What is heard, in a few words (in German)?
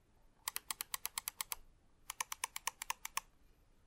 Linksklick Rechtsklick